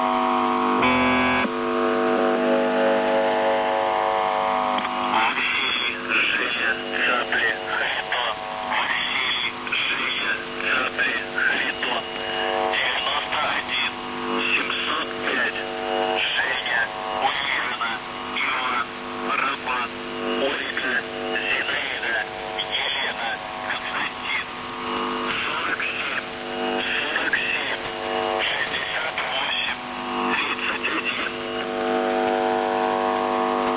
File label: buzzer usb msg